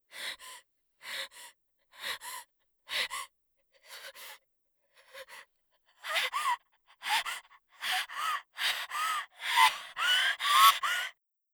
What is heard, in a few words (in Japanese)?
怖い